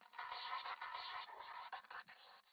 static; Titanfall 2

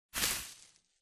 bullet light ballistic sand debris; Titanfall 2

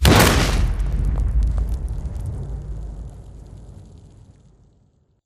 Stalker Nuke Destruct Explo Close; Titanfall 2

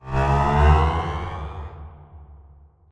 Prop Rack Start Close

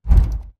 bt footstep jog parts; Titanfall 2

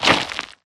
Mvmt FS Jog Dirt Layer; AI Stalker; Titanfall 2

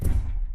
footstep jog; Titanfall 2

